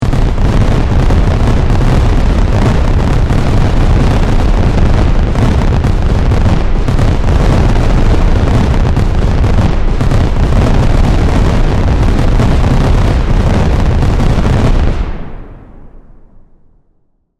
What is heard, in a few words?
Fireworks Long, Burst; Firework Sound Effects; Blast Wave